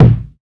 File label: low tone kick drum a sharp key